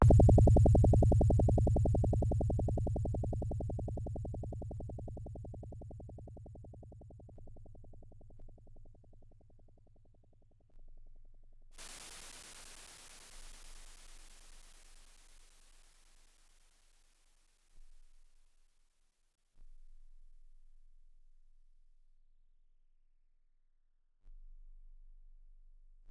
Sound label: Dungeon (Ambient); Realms of Arkania: Blade of Destiny; Das Schwarze Auge: Die Schicksalsklinge